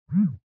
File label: Cell Phone Vibration Blanket